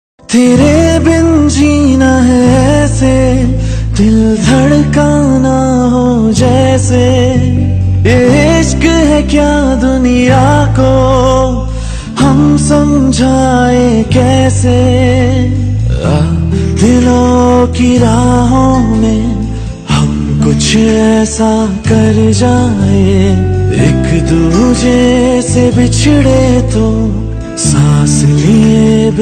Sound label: song ringtone